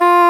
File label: WND English Horn; Roland LCDP04 Orchestral Winds